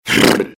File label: evil pain; CAC ASSETS